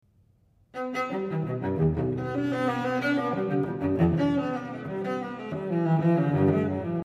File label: Cello